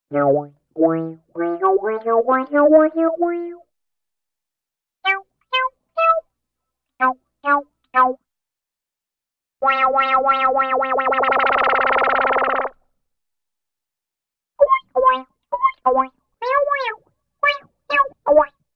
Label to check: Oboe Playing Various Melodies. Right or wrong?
Right